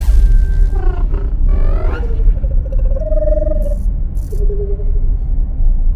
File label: singing